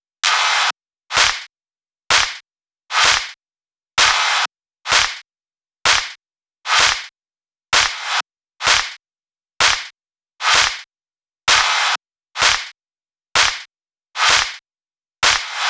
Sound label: Rap Night Club Kings Clap Mix, 128 BPM; Vocal-Hook Kits